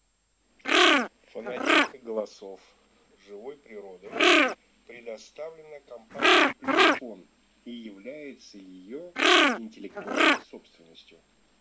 Красноголовый нырок Moriglione; plurifon

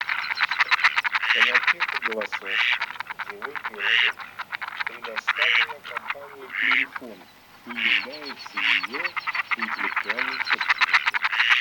Кряква Germano

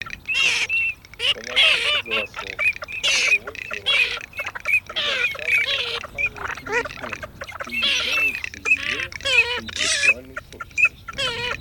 Чирок-трескунок Marzaiola Чирок-свистунок Alzavola; plurifon

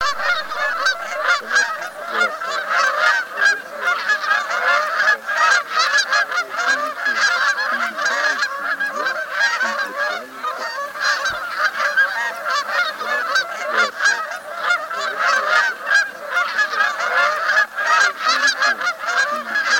Казарка канадская Oca Canadese; plurifon

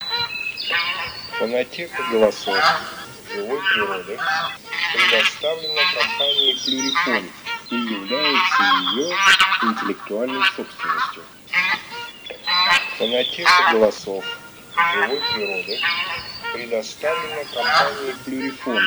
Гуменник Oca Granaiola; plurifon

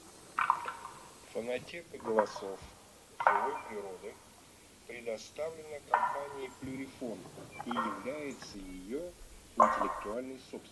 Лось звук рогов Alce Bussare corna; plurifon

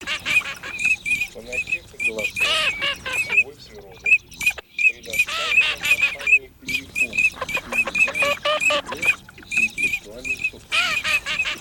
Чирок-свистунок Alzavola; plurifon

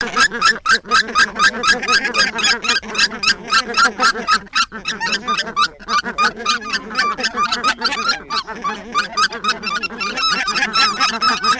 Гуменник базар Oche Granaiolle; plurifon

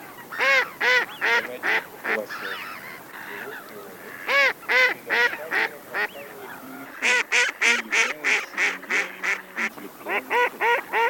Кряква Germano; chip mini 234 plurifon

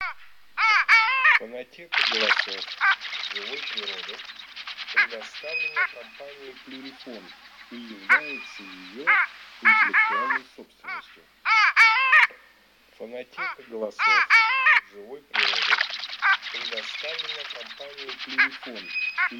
Морянка Moretta Codona; plurifon

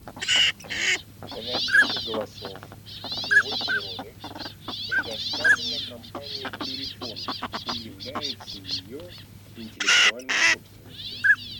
Шилохвость Codone; plurifon

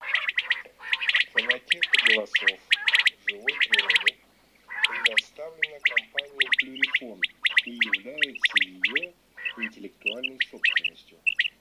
Перепел Quaglia; plurifon

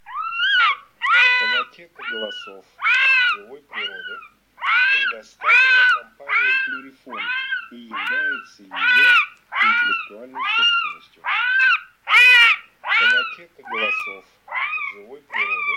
Лисенок Volpe

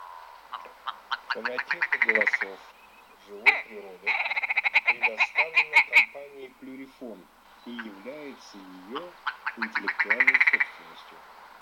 Куропатка белая Pernice Blanca; plurifon

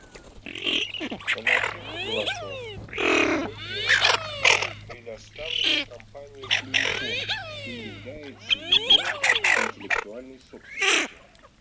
Хохлатая чернеть Moretta Красноголовый нырок Moriglione; plurifon

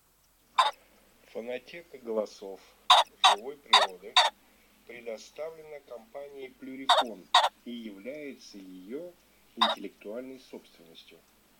Фазан курица Fagiano; plurifon

